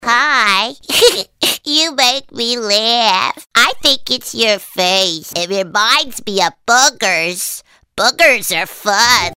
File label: Schpooky Giggles